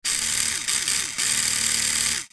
Remote controlled sport car motor revving; rc toys

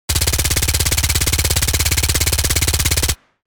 MAC-10 submachine gun suppressed burst; war

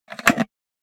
Wood jewelry box open

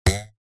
Cartoon boing

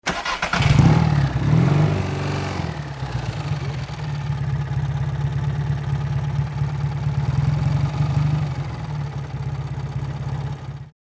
Motorcycle turn on ignition and idle; vehicle

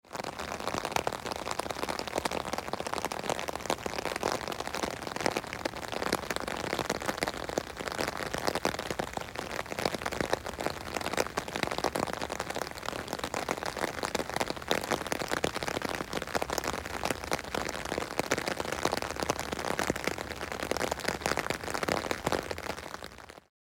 Rain on umbrella underneath perspective

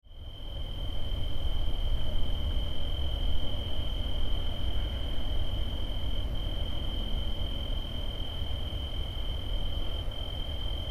Suburban night ambience residential neighborhood

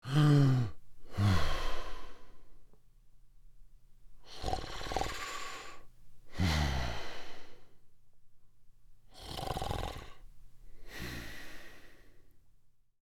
Loud snoring man sleeping

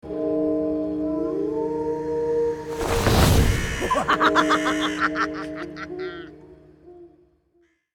Halloween spooky whoosh transition

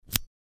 Insert a key into a padlock

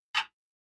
Grandfather clock single tick

